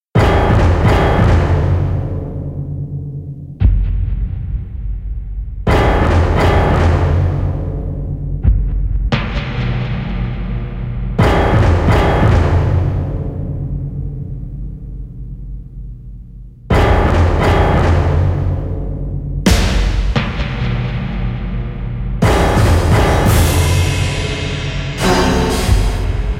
Medium zawa Instrumental, Suspense

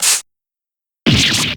Damage

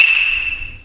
pong

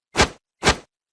vogue

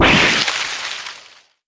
damage; dgs-gregson

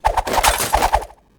disassemble

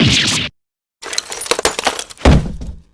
damage; dd-scuttlebutt

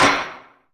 gavel

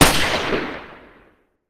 shot; dhurke

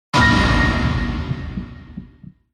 shock

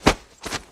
flinch

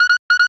blip

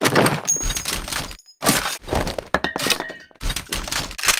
gun lock; gina

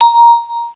happy ping

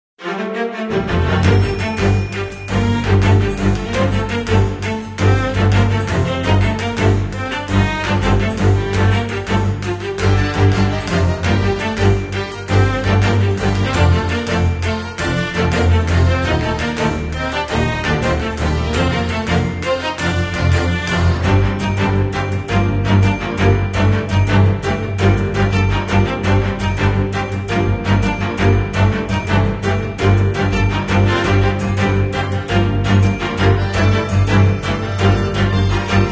Joint Reasoning

